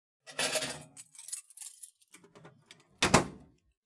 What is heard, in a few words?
locker; 中身（金属・鍵）を取り出して閉める音